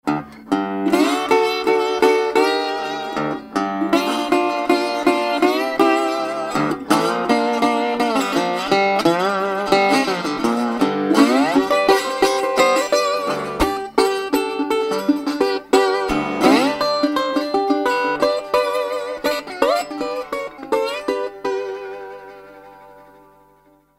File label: Full bodied Tricone, Delta Resonator Guitars